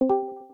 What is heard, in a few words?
discord join